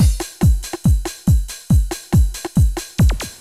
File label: techno 140